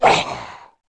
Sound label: fall; monster; immotal ghost